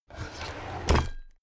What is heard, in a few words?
drawer cabinet crammed open heavy